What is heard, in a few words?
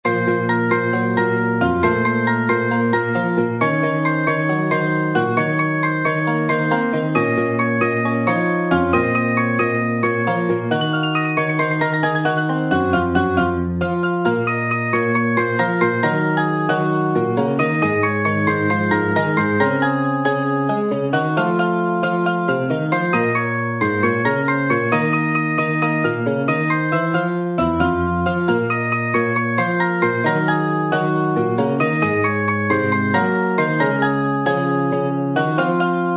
piano